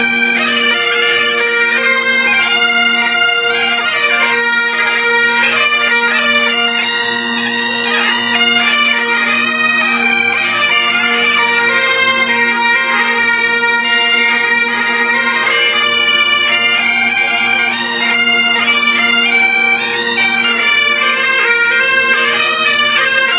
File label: practicing pipes